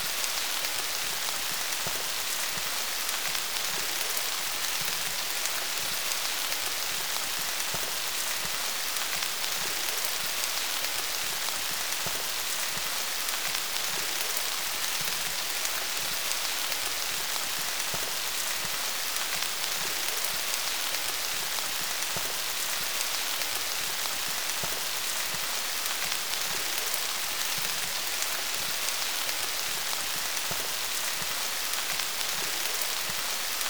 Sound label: Rain Sounds; Zen